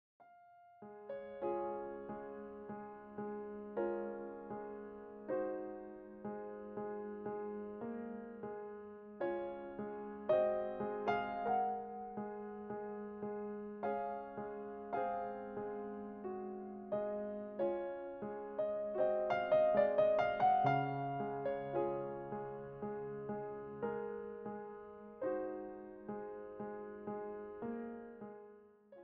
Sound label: Prelude, piano; Raindrop; classical masterworks vol 1